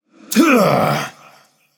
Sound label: clear throat; smite; vamana